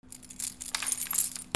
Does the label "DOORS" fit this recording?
Yes